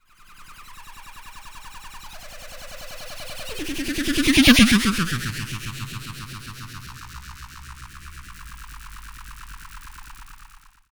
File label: EMS WAVE, SYNTHETIC; AKAI Anime Sound FX